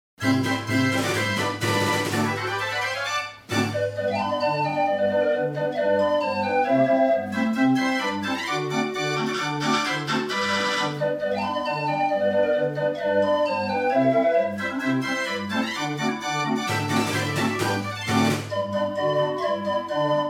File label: Jingle Bells